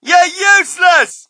crowd, shout; Mike Tyson Heavyweight Boxing

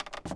creak stop, footsteps

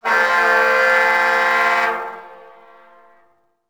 Ship Horn; ambience; Project Gotham Racing 2

